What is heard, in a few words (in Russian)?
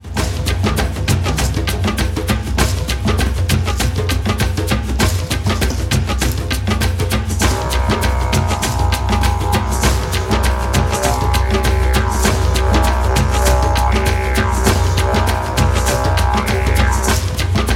vargan i shamanskij buben